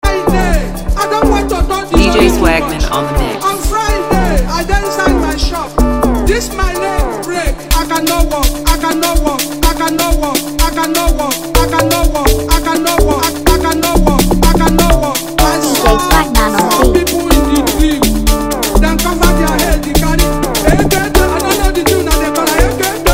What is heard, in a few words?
I Am A Chosen Dance Amapiano